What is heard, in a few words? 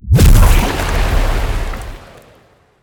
fire; smite; cthulhu